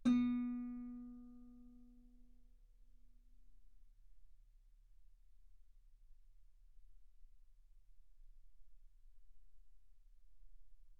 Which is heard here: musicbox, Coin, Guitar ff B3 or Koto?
Guitar ff B3